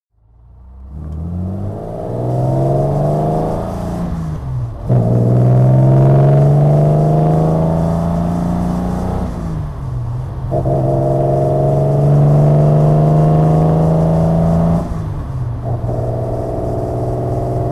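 jaguar etype 42 2+2 weber driving, Engine sound driving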